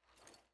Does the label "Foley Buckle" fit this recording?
Yes